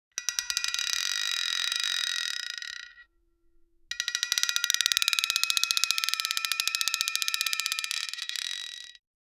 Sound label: roulette ball rolling sound